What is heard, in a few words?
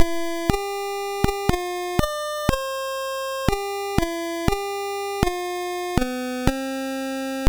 sid, MOS6581R3; Battlefront